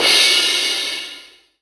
crash sound a sharp key, Royality free drum crash sound tuned to the A# note. Loudest frequency: 4296Hz; cymbal drum samples